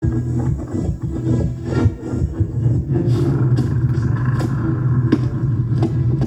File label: Alien amb